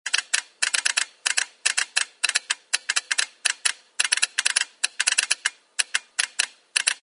telegraph keystrokes

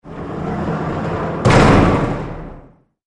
Jail Door Cell Block Alert; Alcatraz

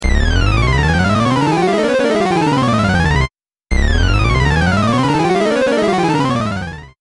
speedy rising and falling

